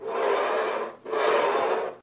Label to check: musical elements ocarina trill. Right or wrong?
Wrong